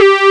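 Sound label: square; sound canvas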